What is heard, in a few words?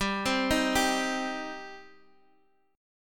G Suspended 4th Sharp 5th G